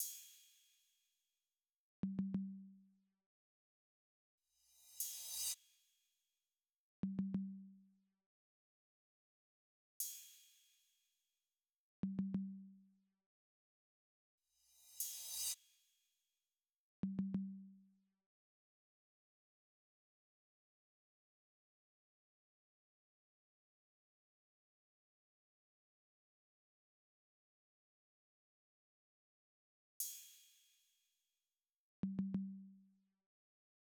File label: Percussion, 96 BPM B Min; Loop Stems; West Coast; Cymatics; GHOST (Beta Pack); Forever